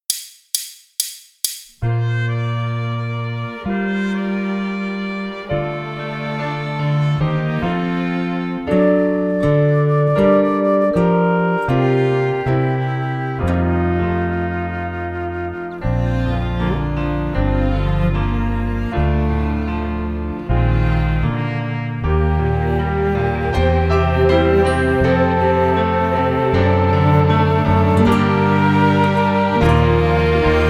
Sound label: Accompaniment